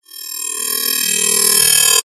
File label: sound design frequency modulation short whining metallic shrill riser